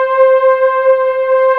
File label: LUSH, PAD; S.PAD